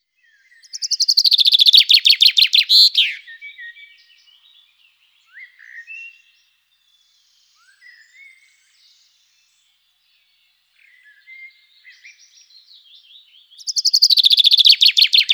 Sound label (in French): pinson des arbres chant